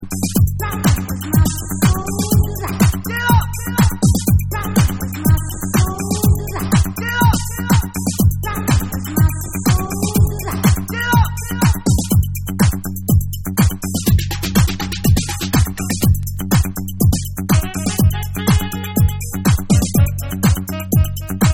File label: Vocal Mix